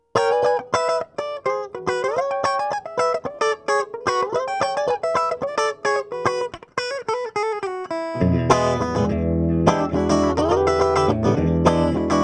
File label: Guitar